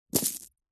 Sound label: household jewelry necklaces drop carpet